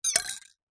household teaspoon set down in mug of hot tea or coffee